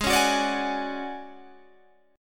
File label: D Major 7th Flat 5th G sharp, chord